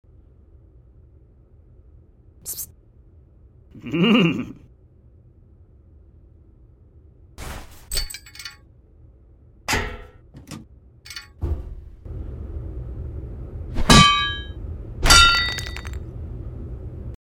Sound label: Boost Up; Fleeing The Complex